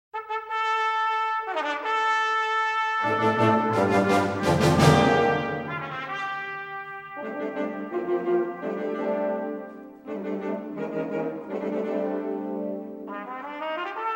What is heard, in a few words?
Concerto For Trumpet And Wind Ensemble; University Of Georgia Wind Ensemble; American Influences